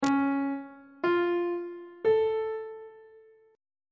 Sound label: d flat augmented triad chord intervals on treble clef